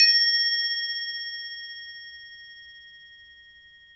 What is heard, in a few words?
Crotales, Orchestral Perc